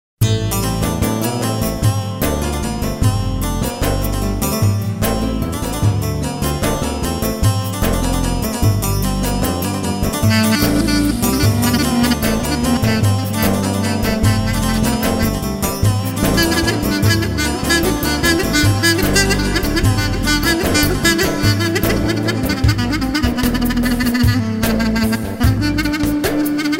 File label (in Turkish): turku 7-8